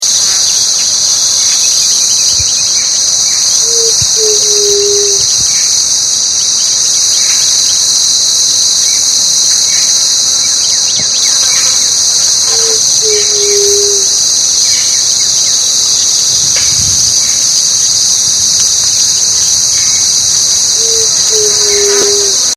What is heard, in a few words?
white tipped dove song